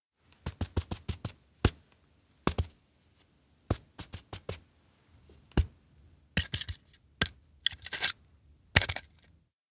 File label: steps outdoor observatory parkour kigurumi; awayuki; anemoi